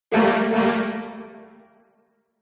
MUSICAL ORCHESTRA Jingle Brass And Strings Dramatic Short; smartsound